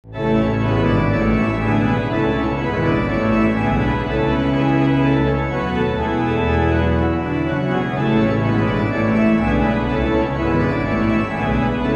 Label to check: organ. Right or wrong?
Right